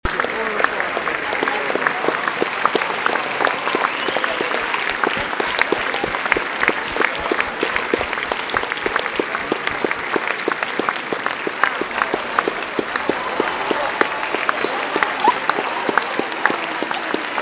Clapping, people clapping and myself saying something; sounds heard : people clapping and myself saying something